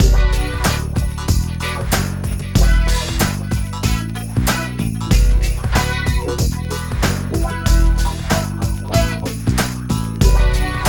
LOOP

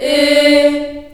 D3 CHS EH, CHILD EHS, Choir; USB Soundscan vol.28; Choir Acoustic & Synth; AKAI

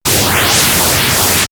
synthfx; StarWars; X-Static Goldmine

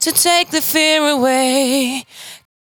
92bpm Bb, Velvet Vocal; Zero-G

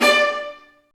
String Sections, Violas Marcato; Roland LCDP13

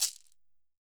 Roland MV8800 percussion; MVPERCUSSION

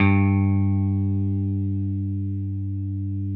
6STR BASS, G; Sampleheads; Whole Lotta Country 1 & 2

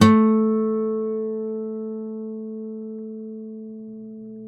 STEEL HARM A, GUITARS; Synclavier Sampler Library

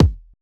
kick, Roland MC-909

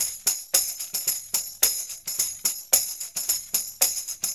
4 4 tam; Percussion

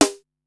Snare High, Korg Wavestation-SR, Drum Kit; Wave 455 - Drum Kit

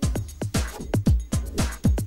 breakloop

